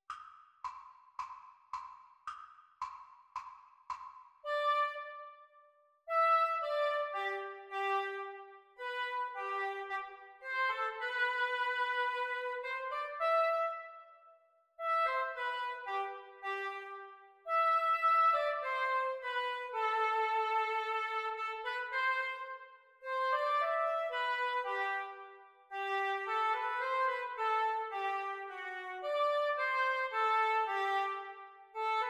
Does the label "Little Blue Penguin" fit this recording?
No